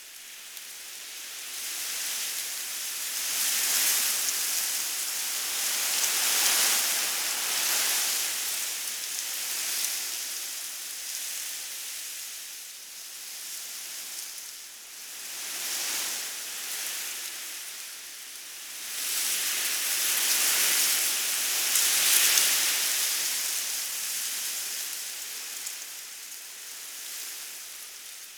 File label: Tree Loop; Ambience; Props; Warframe; Eidolon Plains